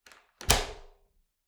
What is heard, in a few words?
Door Bathroom Lock